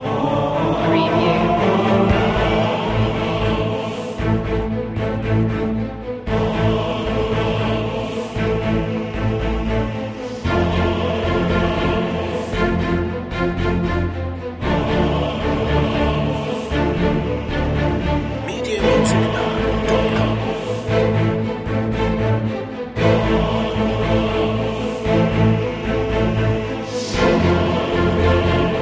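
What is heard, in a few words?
no percussion low intensity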